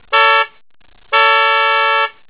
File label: slim spiral